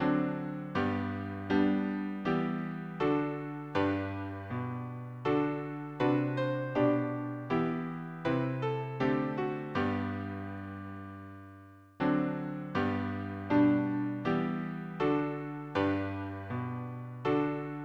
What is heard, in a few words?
harmony piano